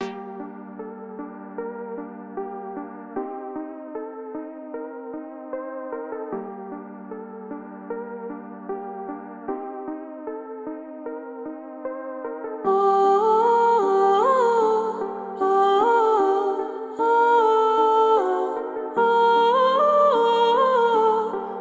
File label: My Beat